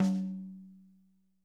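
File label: high tom; 90s sample CD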